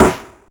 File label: Rimshot, LinnDrum